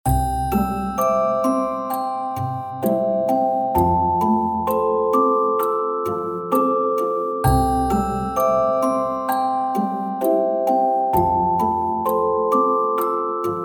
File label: Christmas Eve No Sleigh Bells Loop